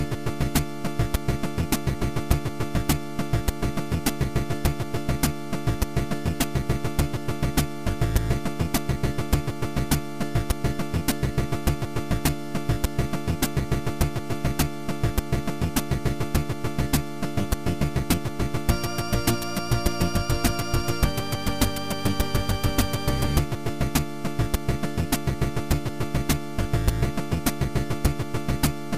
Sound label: solo